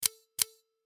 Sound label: Torch